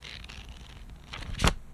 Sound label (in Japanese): page paraparadon